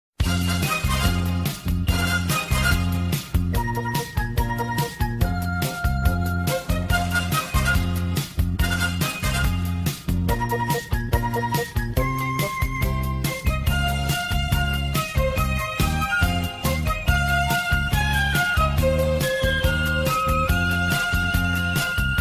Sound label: instrumental